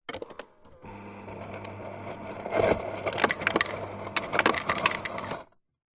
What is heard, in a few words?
household refrigerator ice dispenser serve ice cubes into glass; zapsplat